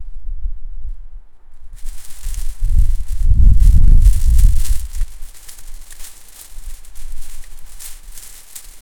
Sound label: swinging on a tree, forest